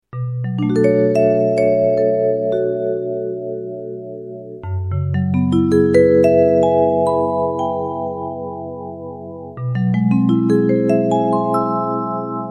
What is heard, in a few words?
Vibes